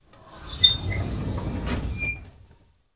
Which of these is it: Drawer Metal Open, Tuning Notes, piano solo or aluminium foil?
Drawer Metal Open